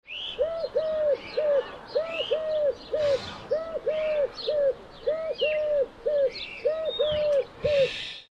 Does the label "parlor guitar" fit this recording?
No